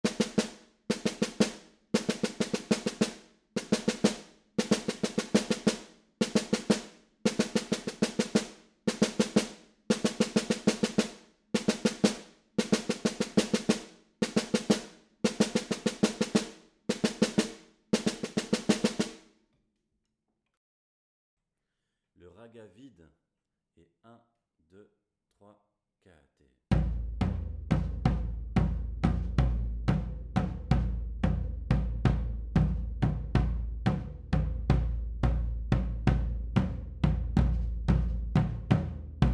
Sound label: Ragga Vide Bass, batucada